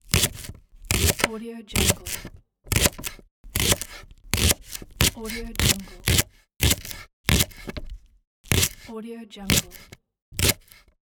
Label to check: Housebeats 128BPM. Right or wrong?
Wrong